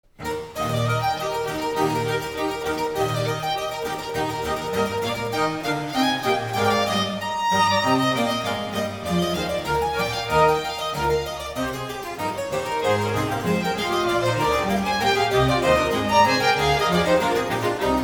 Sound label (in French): Concerto En Mi Majeur; Bach